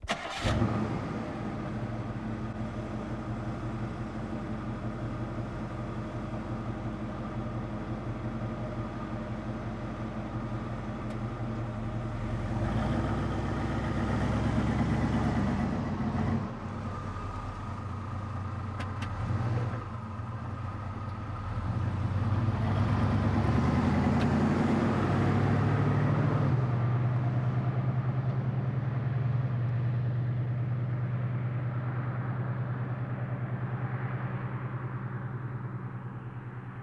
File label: Car Start Idle Away; Vehicles; Cars & Trucks